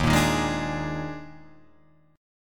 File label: D sharp Major 7th Suspended 4th D sharp Guitar Drop D, chord; 1,1,1,1,3,4; chordshape